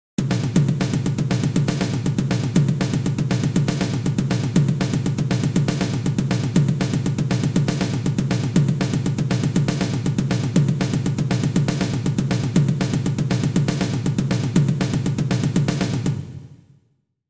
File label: Candombe